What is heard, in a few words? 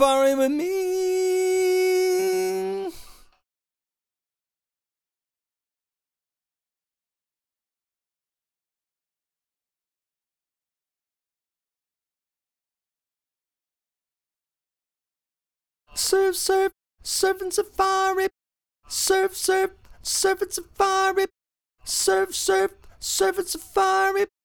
Vocal